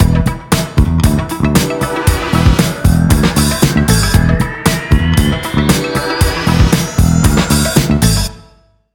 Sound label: Level Complete; Glube Rolls